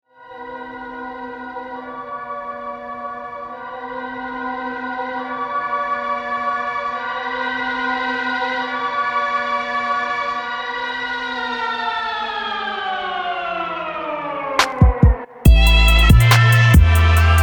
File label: bpm 140